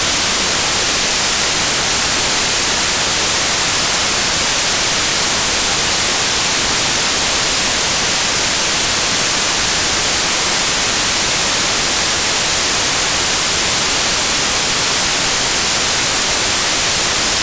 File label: white noise